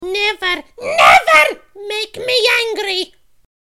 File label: death; freak fortress 2; cream